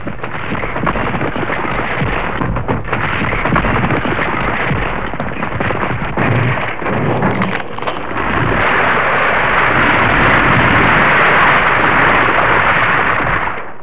huge collapse; destruction

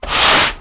heavy item slid on floor; heavy object

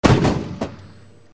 supertank main barrel interrior, machinery; golgotha

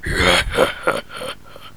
laugh, orc; creatures; sasquatch

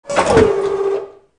supertank missile refuel; golgotha

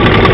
supertank, rumble; golgotha

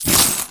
coins bag

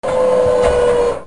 missile truck lower; golgotha

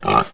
snort, pig; creatures

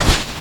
dry sand loose, footsteps; creatures

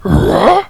whaa, orc; creatures; sasquatch

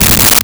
coarse gravel, footsteps; creatures

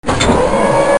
missile truck raise; golgotha

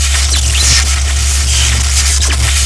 electric tower firing three; golgotha

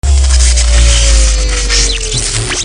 electric tower power down; golgotha

